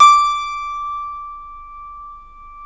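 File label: D 5 clavinet, Keyboards Acoustic; AKAI; USB Soundscan vol.17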